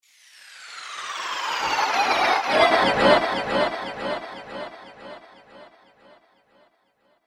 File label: Laser Future Future Fall